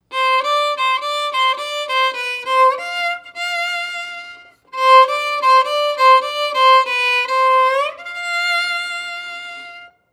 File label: violin; el sombrero; señores ya la musica empieza; Una canción para jugar en ronda, pasando en el acento de la canción un sombrero.